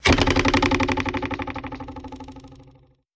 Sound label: Springboard Boing